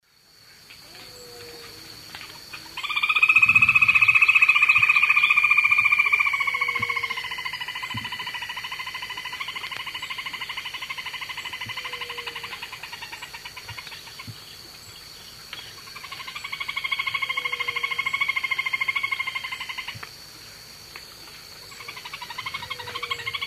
Laterallus fasciatus, song; S. America; Eastern Lowlands

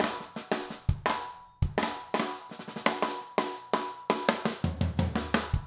jungle 170 fill

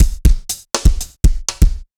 dance floor tight 120, loops

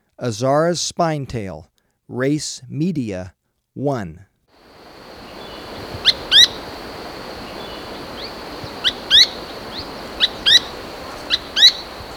Azara's Spinetail, Birds of Ecuador; S. America

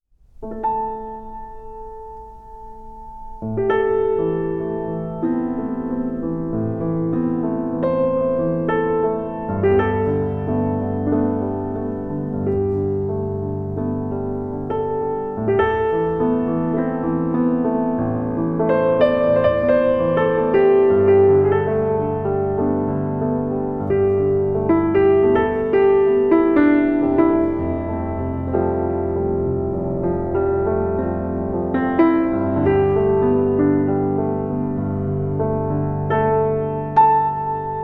Adagio, Peaceful Piano; Instrumental

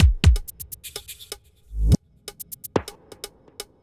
ethnic groove 125

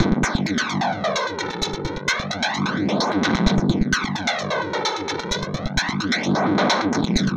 ELECTROCUTION

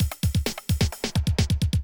electro kit 130

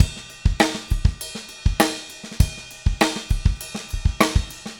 Funkified, Rock Drums

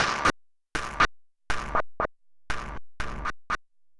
Fading Beat, Electronic Beats; Electronica

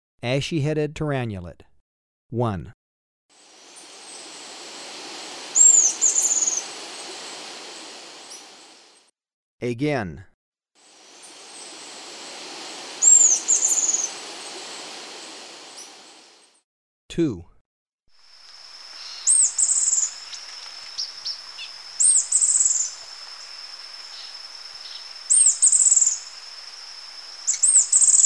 Ashy-headed Tyrannulet, Birds of Ecuador; S. America

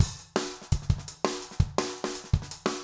jungle 170

